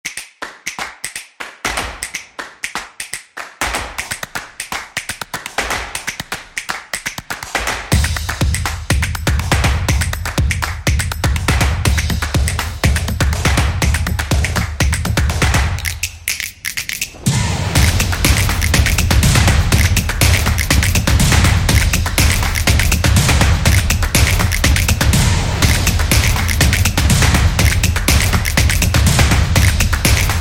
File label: Promo Intro